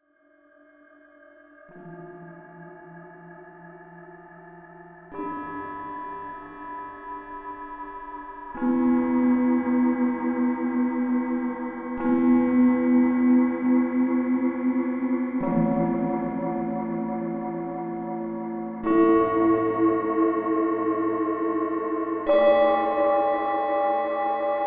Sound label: FM with Delay and Spring Reverb